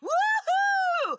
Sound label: woohoo; Ben 10 - Omnicode